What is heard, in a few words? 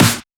Snares; Vengeance - Essential Clubsounds Vol.4